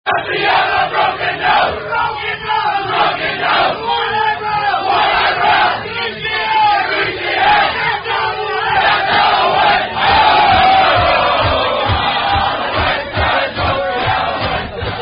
Sung By Fraternity Members; If You Only Knew; Alloutte

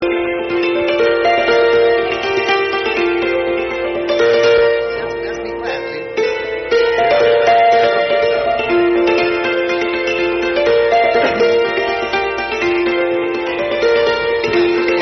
Chording

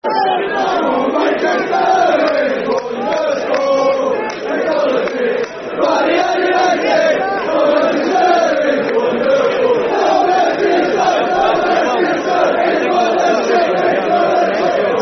Ohhh Manchester, Football Chant; Man United

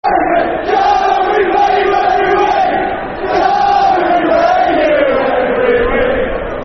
Football Chant (Soccer Songs); Can We Play You Every Week; Cheltenham Town Ctfc

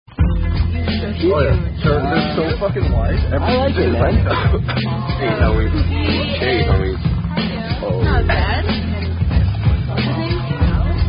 Drinking Game; Bunnys

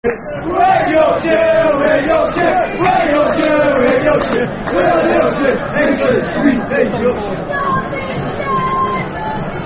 Football Chant (Soccer Songs); We Hate Yorkshire; Chesterfield Cfc

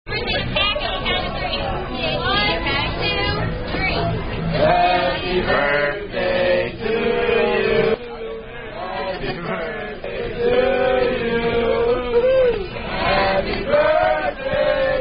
happy birthday sung by wait staff; Happy Birthday (Standard); 21st Birthday At Outback

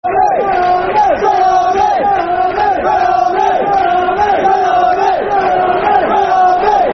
Football Chant; Red Army; Cheltenham Town Ctfc

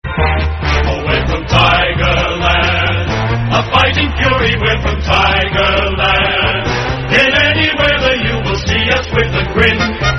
Football Chant (Soccer Song); We're From Tigerland; Richmond Tigers RFC

